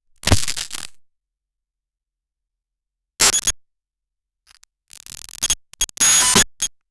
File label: analog horror camera snat